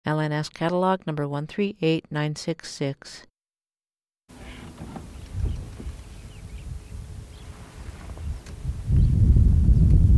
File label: fischers sparrow lark